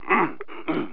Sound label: people